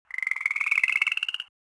rock frog; World of Tanks Blitz

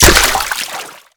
Projectile Sounds; Red Orchestra 41-45

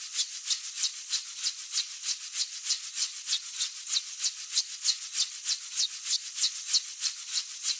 Rotor Mi8 High; DCS

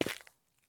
run asphalt; Escape from Tarkov

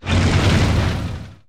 unit move artillery

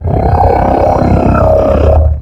biomech vce die

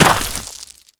gravel land

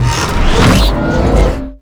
biomech blocker counter

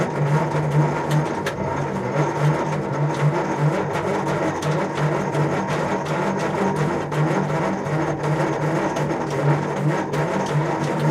turret turn loop manual t34, tanks; War Thunder

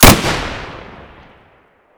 sv98 fire close; Escape from Tarkov

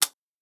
thompson dryfire; Rust

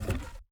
ui pickup wood; Rust

